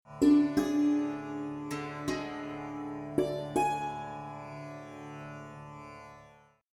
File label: Tivra drone